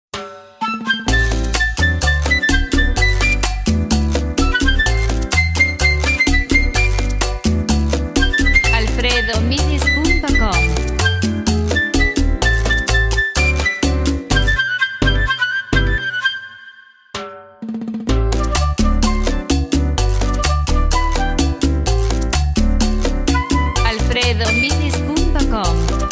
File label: chachacha pista